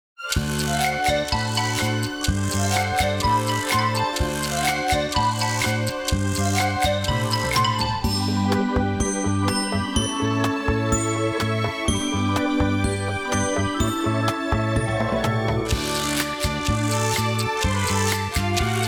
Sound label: Melee Muzak